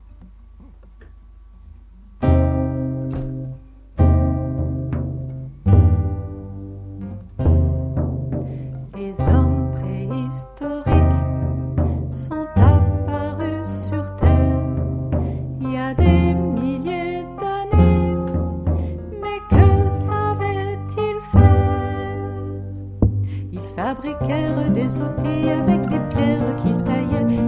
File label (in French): extrait chant